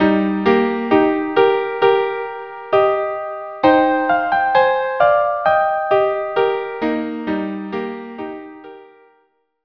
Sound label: violin duo